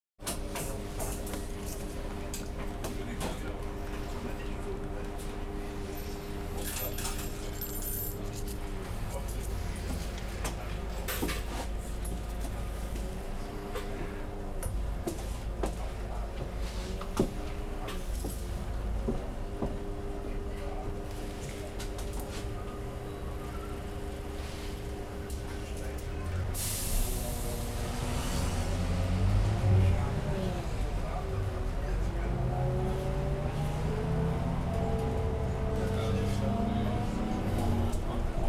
Germany, Bus Journey; Berlin; European Soundscapes; BBC Sound Effects Library

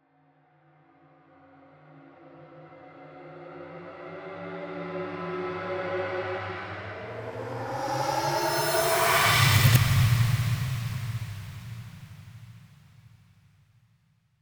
Transition; MatiasMacSD; INSIDE ATMOSPHERIC ELEMENTS; Production Element

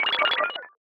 User Interface Notification Bubbles; Glitchedtones

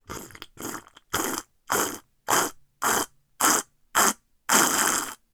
Obnoxious Sip Glass Cup; TS Sound; Obnoxious Sips

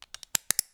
handbag fasten; 3maze; The Closet

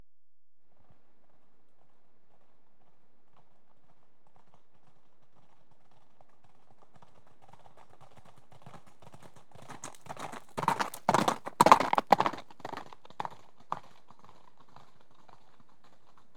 Two horses canter past on gravel; BBC Sound Effects Library

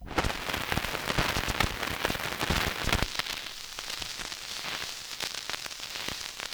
vinyl crackle; 99Sounds; Vinyl Noise SFX

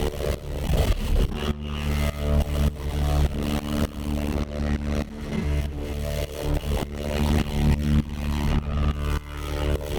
Electric Arc Deep Bass Seamless Loop; Electric Arcs and Energy; Sound Spark LLC

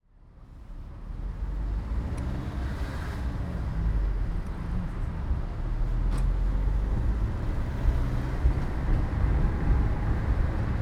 Driving, Transportation; Nikko Barrera-Amaya

